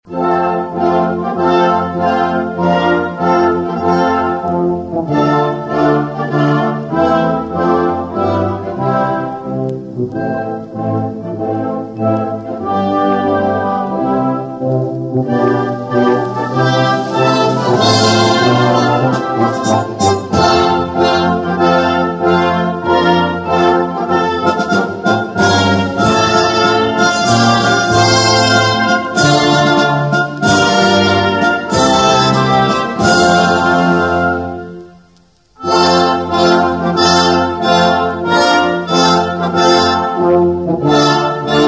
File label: hymna instrumental